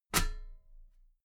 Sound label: metal ladder step regular